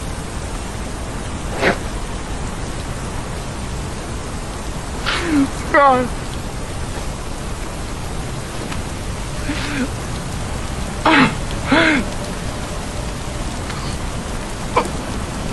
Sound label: crying; michael fassebender; audio meme